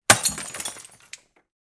Beer Bottle Break; Single Small Glass Bottle Breaks, Smashes, With Small Pieces Falling.